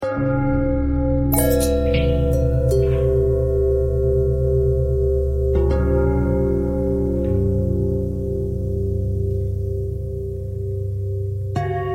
long bell bell thread simple, bells

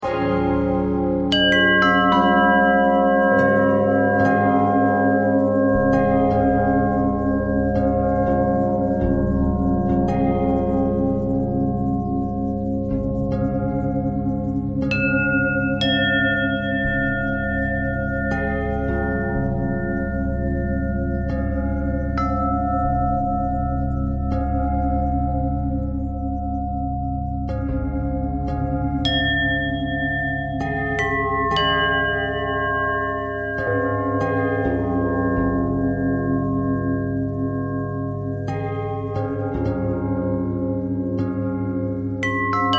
bell bell thread pentatonic, bells

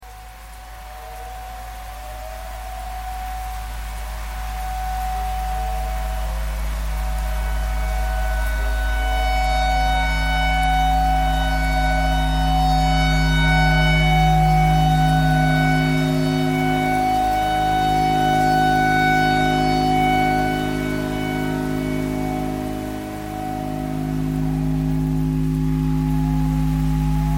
tornado siren clarinet simple

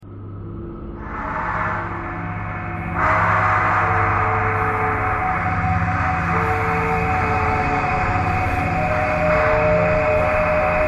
thread noise

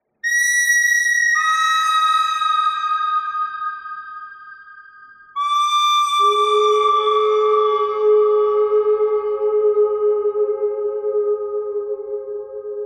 bird thread noise

